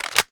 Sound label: sfrifle magin, guns